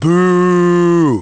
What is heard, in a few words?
"Booo"; gameplay comment danger